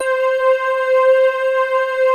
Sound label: BELL LIGHTS, JV PADS; Pro-Rec - Synthsonic